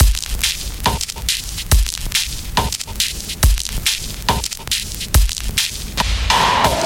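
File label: drum loop 140 bpm broken beat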